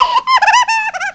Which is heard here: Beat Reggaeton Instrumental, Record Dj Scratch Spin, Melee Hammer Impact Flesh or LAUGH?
LAUGH